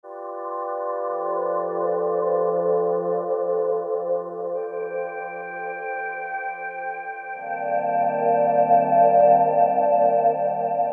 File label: Arctic E know, Roland JP-8000 Factory Performances